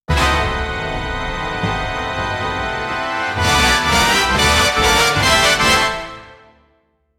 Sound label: maximum energy jackpot, circus